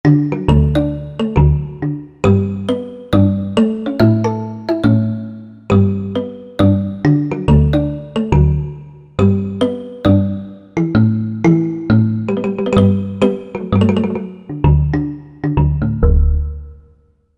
Bass Kalimb, Korg MSC-10S Ethnic

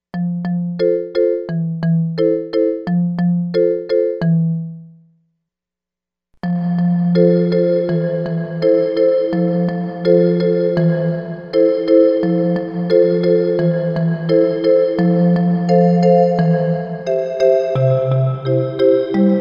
LARGE CHAPEL

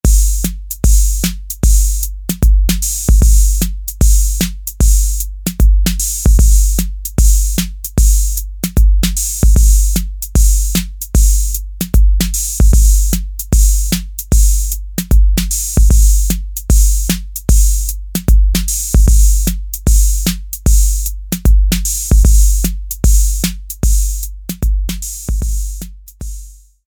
Rhythm, Roland TR-808; Factory

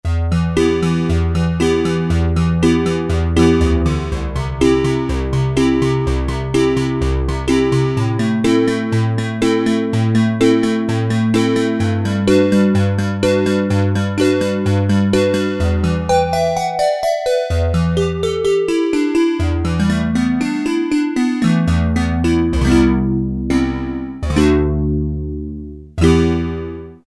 Synth Vib, Casio MT-600; Tones

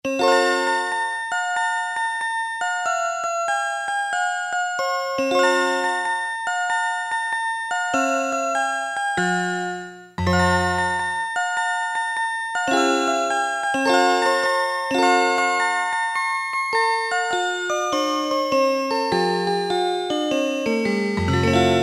glockenspiel, Casio MT-500; Tones

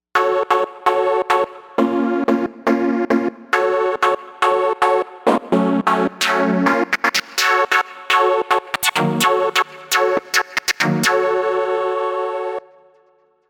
Chord On; Novation UltraNova; ChuckieSoundpack

